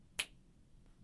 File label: fingers snap in a small cabinet, A finger snap with both hands performed in a small cabinet